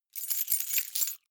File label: key ring large rattle hard; objekte; schluessel